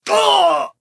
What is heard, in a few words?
Wound Crit; Valanar